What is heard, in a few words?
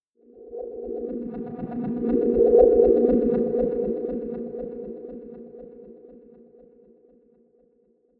Mystery Magic, magic sound effect; Role Playing Game; Andrew Fleming